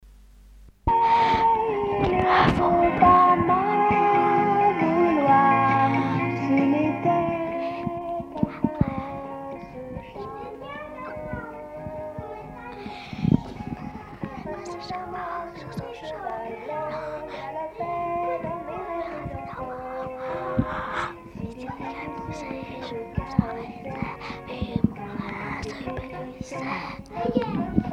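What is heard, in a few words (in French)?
Karaoke chuchotté enfant; call fire emergency; Documents Bruts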